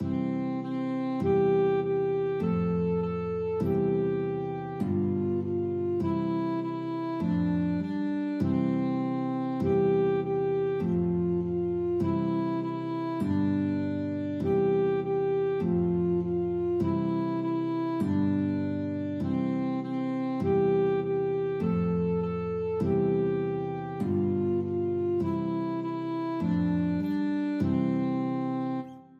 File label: alto sax guitar duet